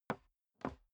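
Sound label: step boots wood creak scuff, footsteps; schritte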